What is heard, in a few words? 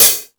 High Hat, Drum Machines